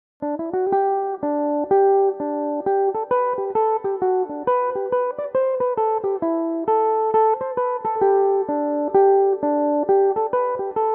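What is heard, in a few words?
g major, mandolin; Glengariff Bay; (4/4); Belmuse EM-190 electric mandolin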